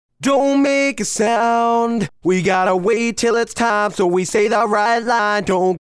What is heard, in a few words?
fast Verse Distressed